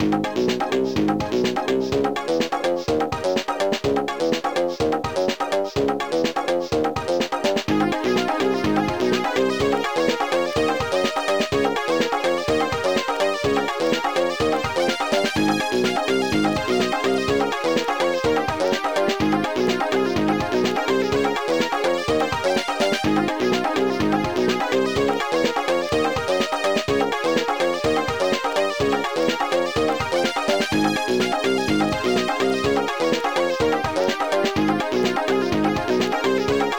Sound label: Level BGM; Titeuf Ze Gag Machine; GBA